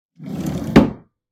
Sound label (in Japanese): 襖を強く閉める音; door